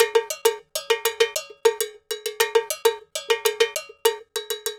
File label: Ensemble, Latin Percussion